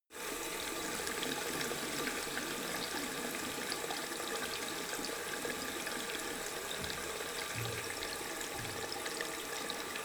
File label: tap flow medium long, Badezimmer; haus garten